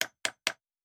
Nail; Fantasy Sounds Bundle; Craft

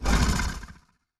Large Creature Short, Monsters; Fantasy Sounds Bundle; Large Creature 13

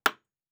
Nail; Fantasy Sounds Bundle; Craft

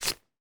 Drop Object; Interaction; Heroes of Hiis; Oculus

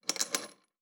Chain; Fantasy Sounds Bundle; Craft

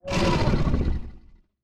Growl, Monsters; Fantasy Sounds Bundle

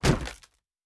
Shield Wood; Fantasy Sounds Bundle; Medieval Combat Sounds

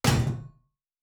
Shield Metal

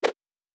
Whoosh; Fantasy Sounds Bundle

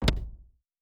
UI Click; Fantasy Sounds Bundle

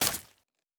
Stab; Fantasy Sounds Bundle; Medieval Combat Sounds

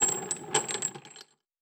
Chain; Fantasy Sounds Bundle; Craft

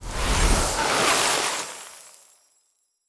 Ice Spell, Magic Sounds; Fantasy Sounds Bundle

